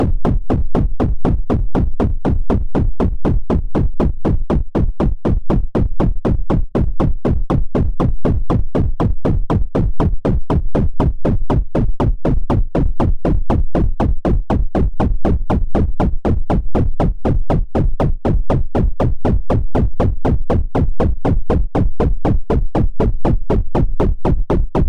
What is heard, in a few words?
drum bpm; Example scripts for the SAU language, and audio files rendered from them using the saugns